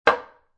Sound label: Toilet Seat Open, Household, Toilet Seat Open; Bathroom